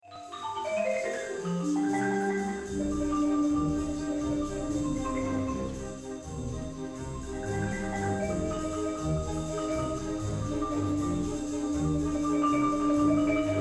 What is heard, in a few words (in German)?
marimba concerto